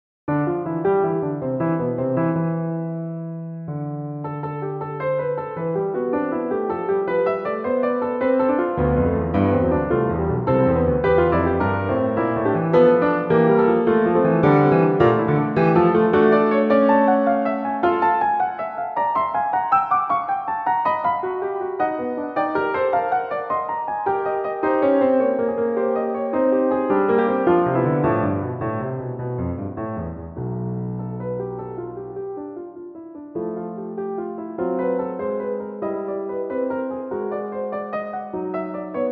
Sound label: Toccata; Cruel Opuscules